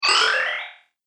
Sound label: Magic Power Up